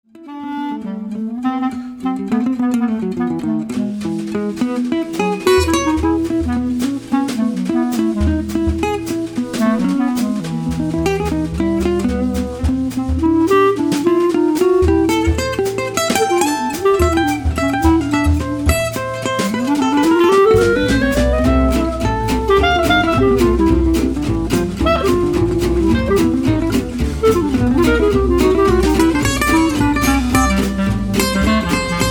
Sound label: swing